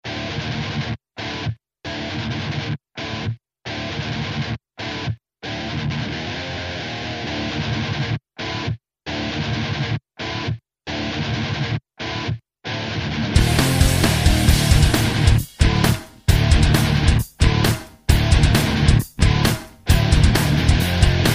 heavy metal

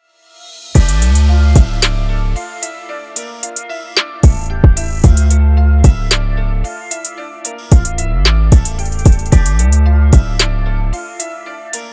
instrumental

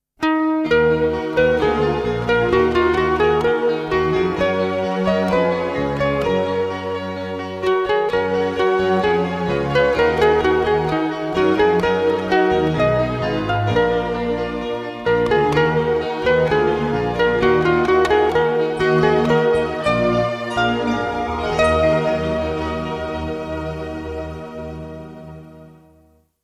guitar in love

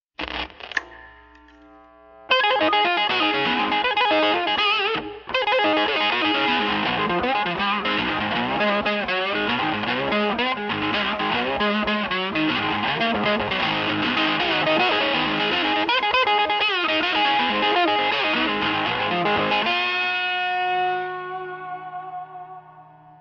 incredible rock guitar

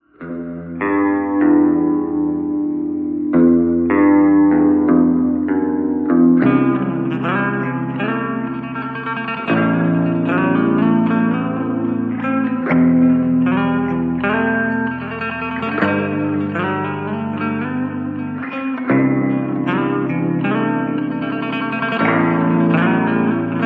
instrumental guitar slowed

